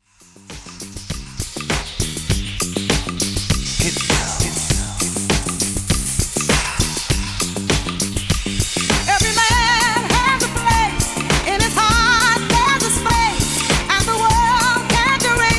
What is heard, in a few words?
Classic Mix 100 Bpm; djyan